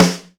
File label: natural snare drum sample f key; snare drum samples